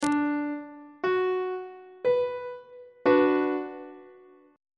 c flat minor 1st inversion on treble clef

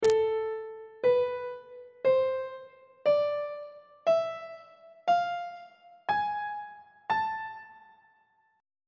a harmonic minor scale on treble clef

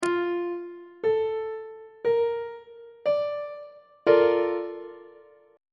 a sharp major 7th 2nd inversion; on treble clef

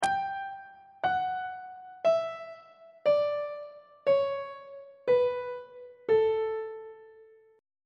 a mixolydian mode descending on treble clef

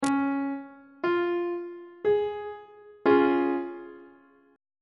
c sharp major chord; treble clef

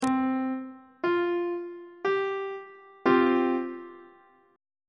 c suspended 4th chord on treble clef

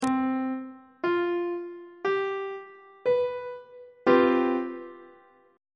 c major 7th suspended 4th chord; on treble clef

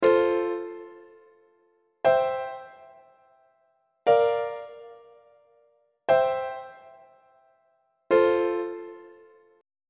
e sharp major plagal cadence on treble clef

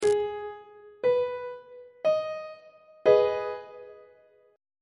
g sharp minor chord on treble clef

